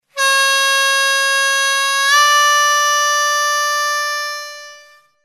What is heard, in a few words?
bend, harmonica